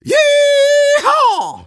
taunt exert

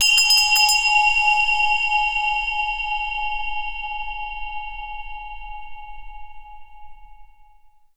zen bell

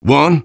taunt rps exert

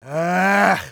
negative vocalization, de; Medic

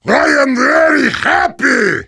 positive vocalization; Heavy

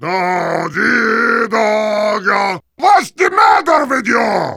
singing; Heavy

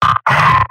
pain sharp; Pyro; mvm

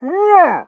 Pyro taunt flip exert

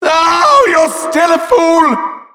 headbomb hit

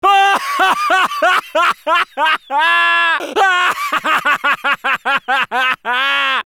laugh long, fr

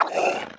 throwable attack

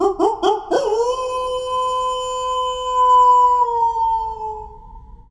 Wolf howl

